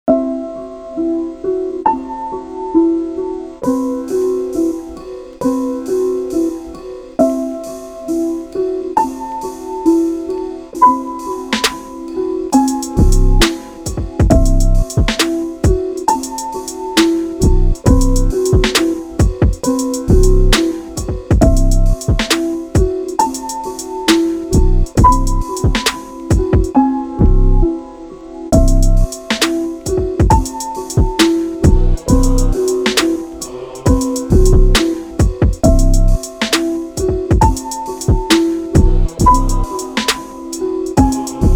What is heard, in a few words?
piano